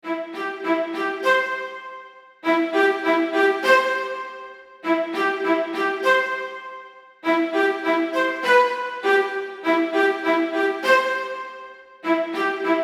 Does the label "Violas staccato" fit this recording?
Yes